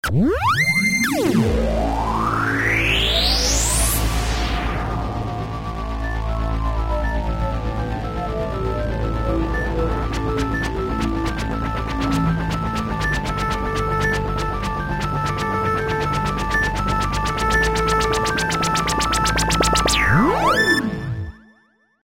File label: ARP Axxe